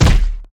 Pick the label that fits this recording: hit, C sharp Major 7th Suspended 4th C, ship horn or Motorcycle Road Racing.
hit